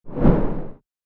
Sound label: Swoosh low